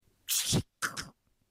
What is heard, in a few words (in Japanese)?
缶を開ける